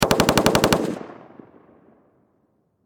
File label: Machine Gun M16 Long Burst Distant Perspective Farther Away